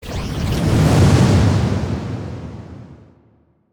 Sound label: Wind Bolt; SNKRX